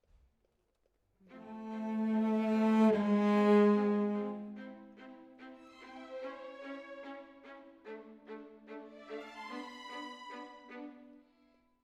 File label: Cello Solo